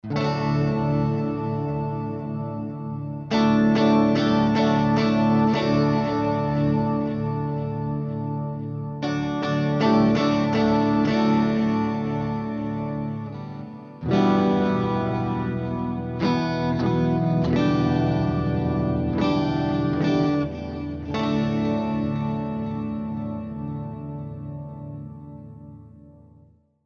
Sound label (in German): boss gigcaster 5 gitarre overtones